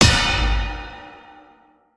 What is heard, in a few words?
Anvil Item